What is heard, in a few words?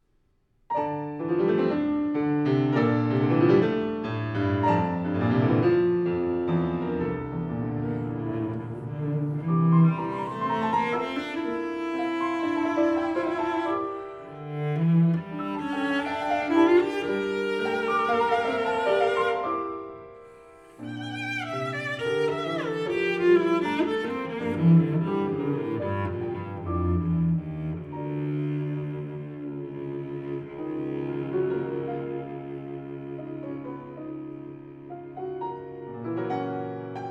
Improvisation